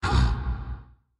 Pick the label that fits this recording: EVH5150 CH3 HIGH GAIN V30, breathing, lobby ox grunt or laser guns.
lobby ox grunt